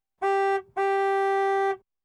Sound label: Landrover Safari Diesel Horn; Farm Machinery; BBC Sound Effects Library